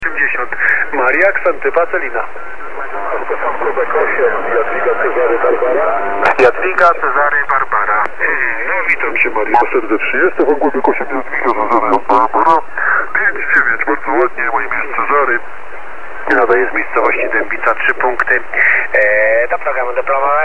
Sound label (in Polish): stroj 5Hz